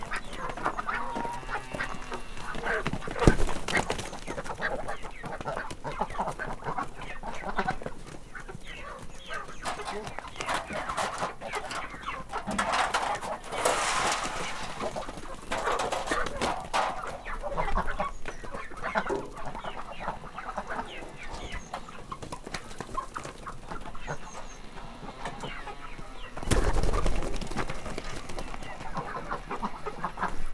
baromfi lemeztálból csipegetnek felreppenő galamb; parasztudvar

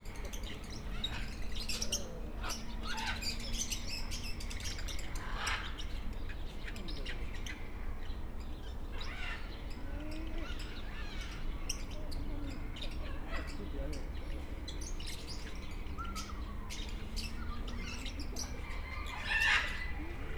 vari; állathangok; nyíregyházi zoo 2010

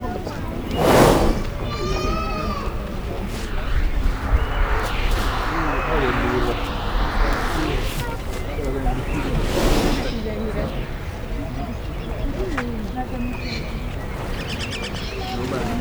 szimatol, azsiai elefant; állathangok; nyíregyházi zoo 2010

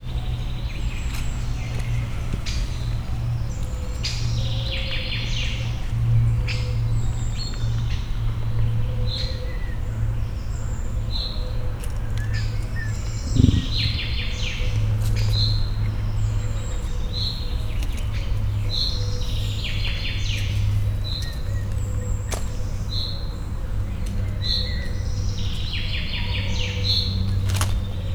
az anya mély hangot ad, a csibék nagyon halkan fütyülnek, és madarak, emu; szegedi zoo 2011

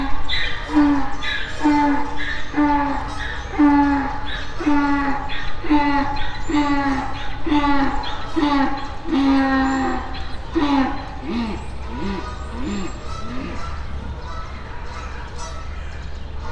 távolabb kanadai ludak, magyar parlagi szamár; debreceni zoo 2012

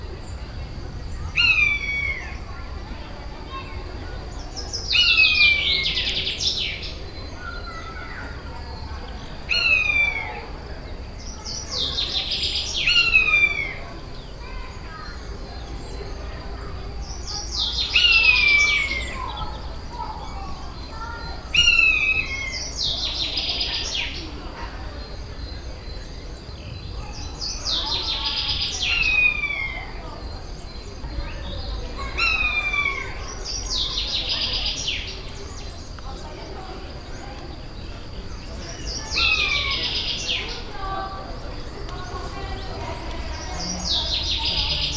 vijjogó madár, egerészölyv; allathangok; veszpremizoo 2013

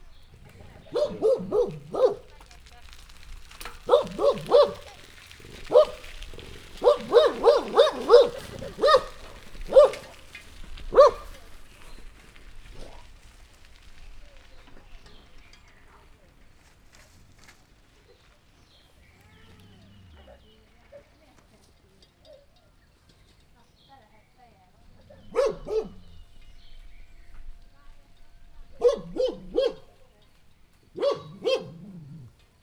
vasutallomasrol elkerekezok kutya hortobagy; kerekpar varosi

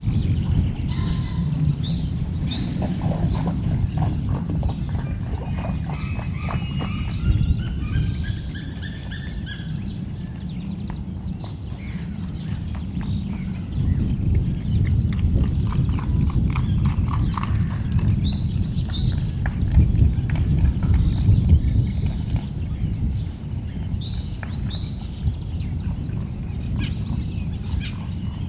ahogyan a sárban szaladnak szeles idő, szürke farkas; hortobágyi nemzeti park 2013

